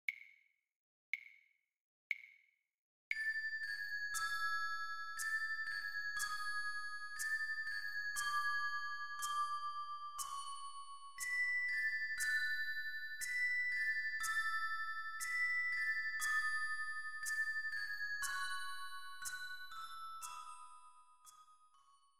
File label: metro up